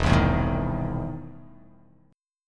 E Major 7th Suspended 4th Sharp 5th E down; EM7sus4#5 strummed

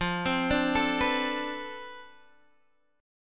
D-Minor 13th, F

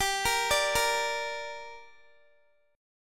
G Minor G down Guitar, chord; Gm arpeggiated

